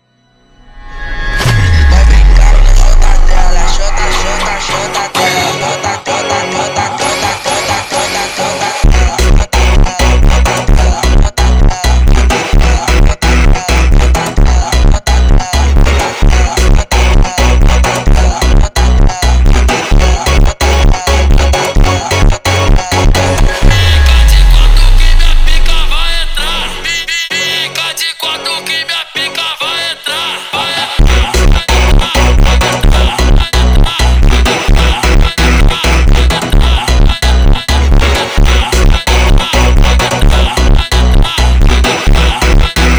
Funk

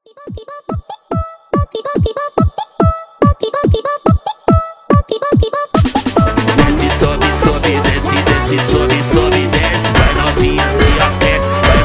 Funk speed Up

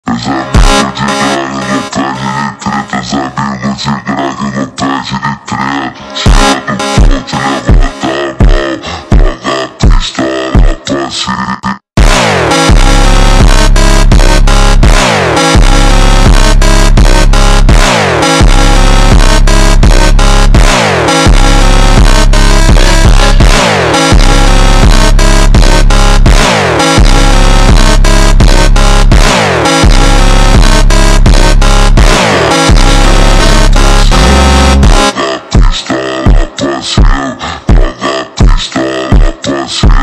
Phonk funk super slowed

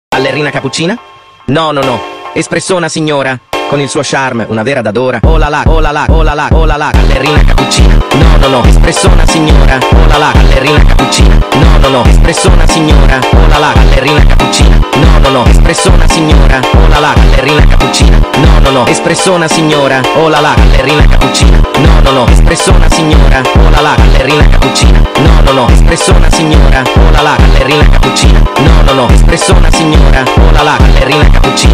Funk sped Up

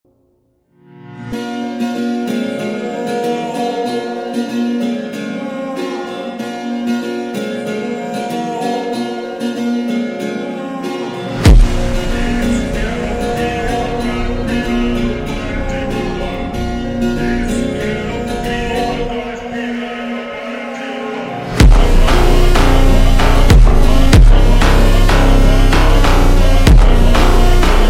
Funk slowed

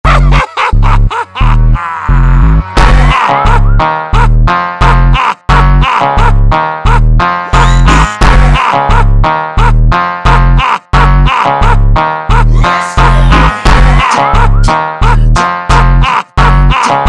Meme Funk ultra Slowed

